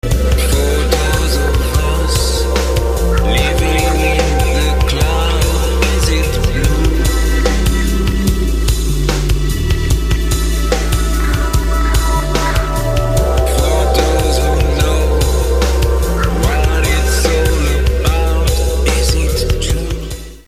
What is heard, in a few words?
electro body music